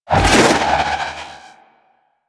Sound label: Trooper Melee Spin; Warframe; DE; Sentients